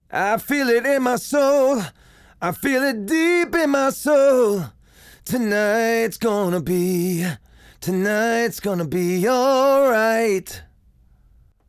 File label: Vocal Hard Compression